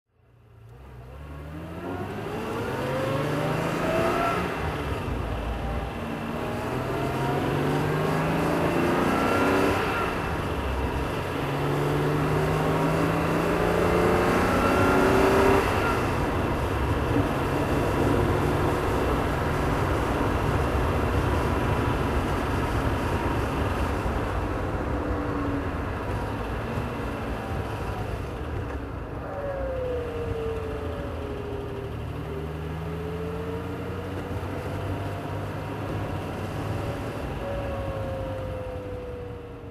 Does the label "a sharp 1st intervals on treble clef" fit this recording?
No